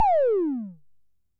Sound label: tom, Coron Drum-Synce DS-7